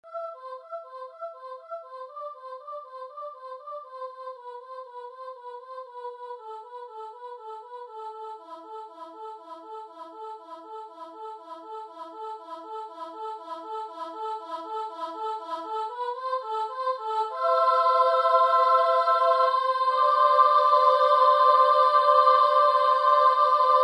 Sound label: air vocalise soprano